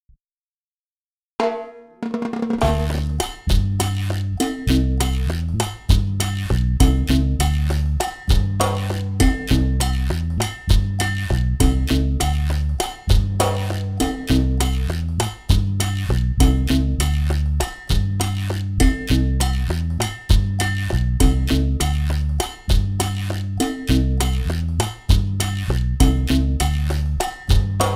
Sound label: Chachacha